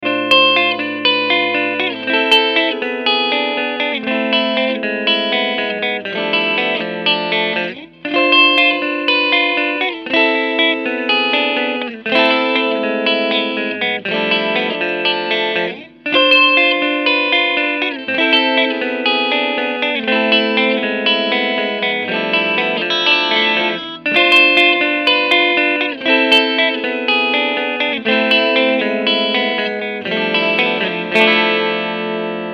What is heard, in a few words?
Ibanez neck